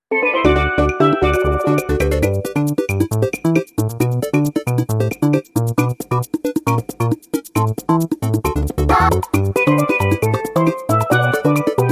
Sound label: Beach; NSMBW; Beach World